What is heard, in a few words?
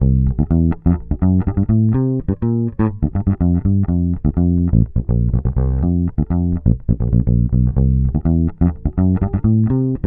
Bass loop 124 C, Electric Bass Loops Direct; Sponge; Slate Digital BASSHOLE Sample Pack